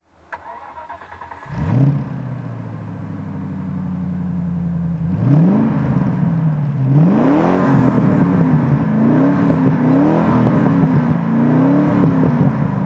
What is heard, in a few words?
morgan +8 throttle